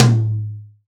Big Tom Drum A Key, tome drum sample tuned to the A note. Loudest frequency: 1113Hz